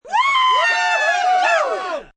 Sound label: Group Cheer Short, Cheering, group, short (3-7 people), sports (human voice); Crowds; Sound Effects:Voice; Voice Box